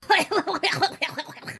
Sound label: evil laugh; Various Evil Sounds